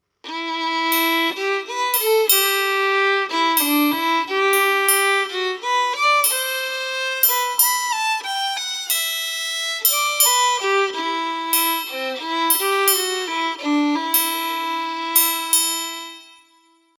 duo triangle et violon; loto sonore

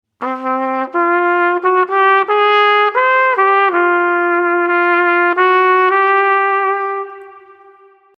impro trompette; pour les petits; loto sonore

